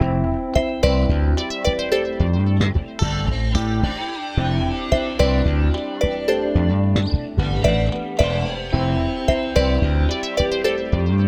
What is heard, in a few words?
110 melodic stack Cmaj; velvetindigo